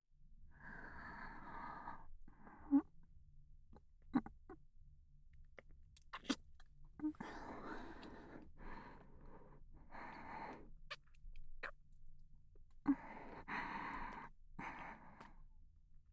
キス; SEなし

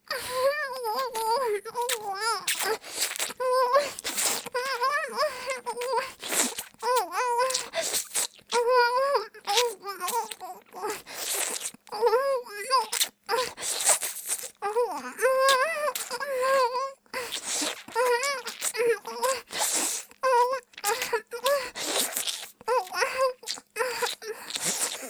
イラマチオ; いちゃらぶ

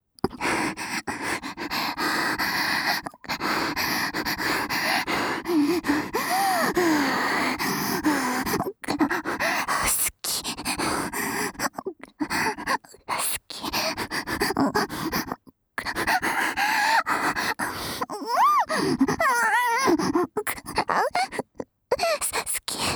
好き好き連呼密着正常位えっち

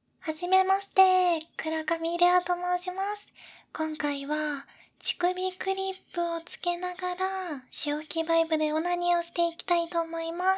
潮吹きバイブ出し入れ, バイブ機能付き乳首クリップ乳首攻め

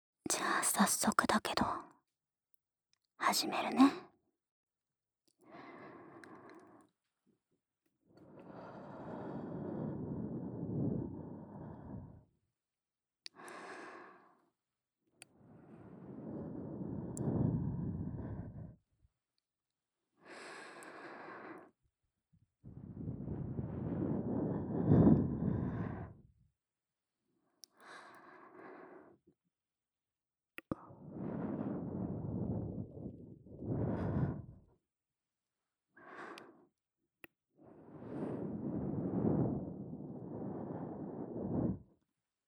耳ふーふー（右）, ASMR

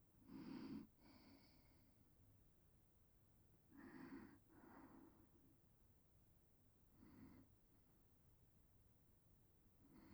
添い寝＆寝息

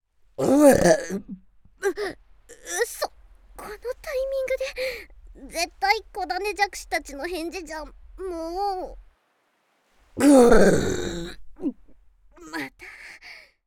お返事ゲップ2回; ザーメンゲップ集; 子種じゃくし

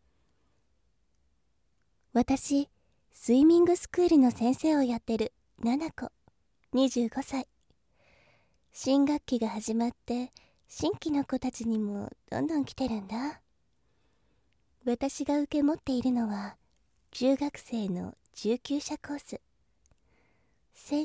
フェラ イラマチオ

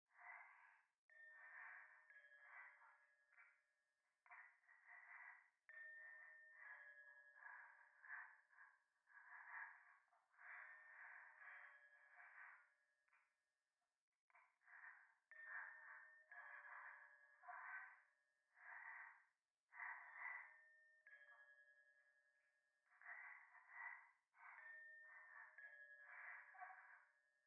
夢の中 右耳快感吐息 神社

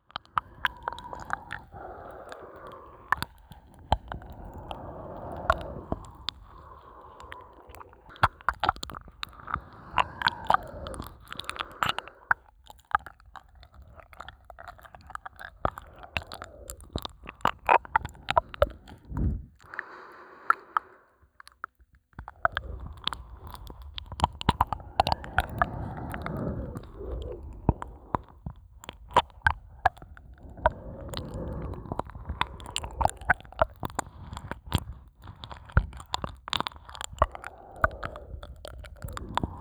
耳舐め; おまけループ音声

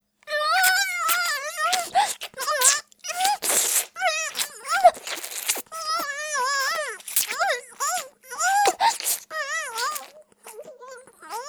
イラマチオ; レイプ

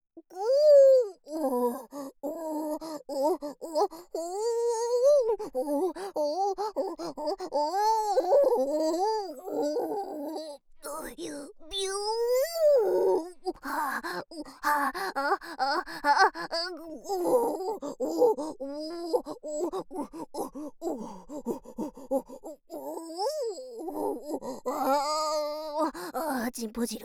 オホ声; オホ声を上げる後輩; 効果音なしHシーン

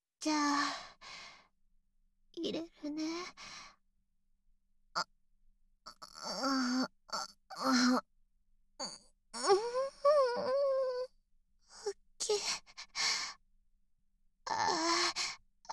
挿入 喘ぎ; SEなし

